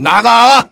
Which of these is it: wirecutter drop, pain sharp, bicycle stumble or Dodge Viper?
pain sharp